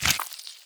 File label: Syringe; Medical